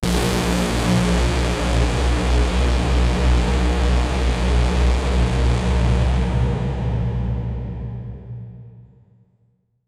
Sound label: quickening reverb pulse